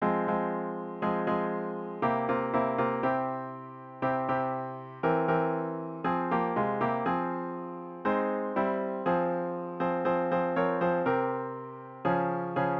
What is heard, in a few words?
midi